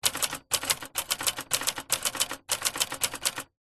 office typewriter buttons keys presses typebar strikes short fast typing sequence; zapsplat